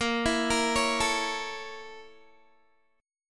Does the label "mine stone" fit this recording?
No